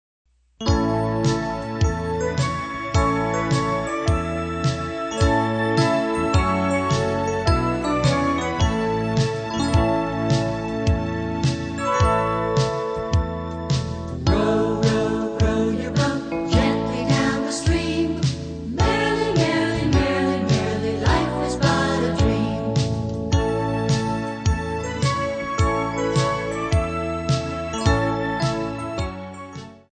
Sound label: row row row